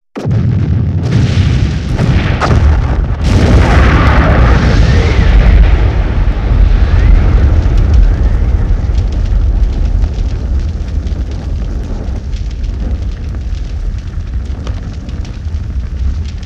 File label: Explosion, 5.1 Surround